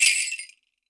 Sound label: MIXED PERC; 90 s Sample CDs